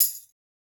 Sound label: Tambourine Hit; Hip Hop; Hip Hop Exotica; Apple Loops; Big Fish Audio